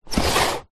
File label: cardboard box parcel slide on wooden floor; foley